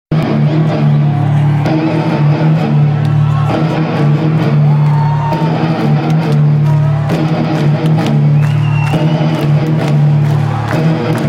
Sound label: riff